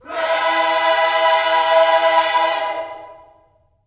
PRAISE, Vocal Planet Gospel

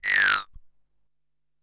Korg, FX; Back In Time Records; Korg Universe VOL-1; FX FAMILY

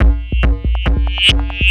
Beats, Industrial

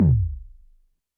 Tom Synth, YAMAHA RM1X

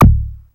REZ, TEC INDU PER; LOG; Crypton - DrumBase5000

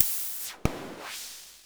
Perc Hit, Noise

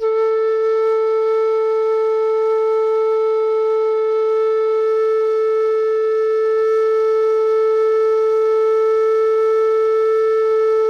FLUTE MF A4; Greytsounds - Philip Wolfe David Crozier's Saxophones; FLUTE MF-PW

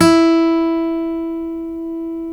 STEEL E, FOLK STEEL Guitars; Spectrasonics; Hans Zimmer Guitars VOL-1

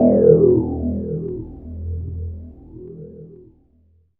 DR. LOOP, TRANCE; X-Static Goldmine 3

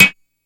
Plink one shot, SFX; Sonic Foundry (Sony Creative Software); Electronic Point Blank

